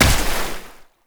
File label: Heavy Step Water, Footsteps; Enemies; Foley; Warframe; Tau Dax